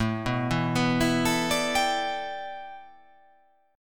A 7th Suspended 2nd Suspended 4th

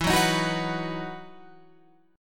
E Major 7th Suspended 2nd, Guitar, Open F; EM7sus2 strummed

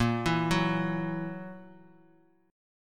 E Suspended 2nd Flat 5th A sharp

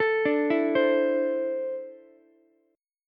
D 7th Suspended 2nd down Ukulele; D7sus2 arpeggiated

